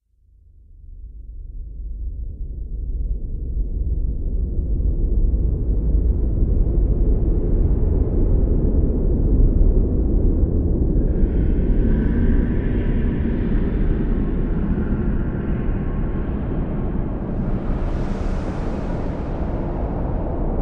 Storm Dragons Atmosphere, monster sound effects

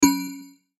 chime low, Chime Low Metallic Ring; Chime Low Metallic Ring Production Element Imaging Element Accent Transition; Multimedia; Chimes; Blastwave

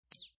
Cheep; Chirps

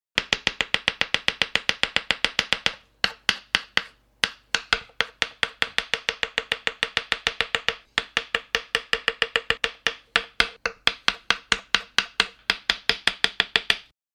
Rapid chopping of food on chopping board.; Kitchen; Household

